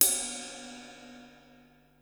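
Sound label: cymbal ride; 300 Drum Machines; Yamaha Motif ES-Series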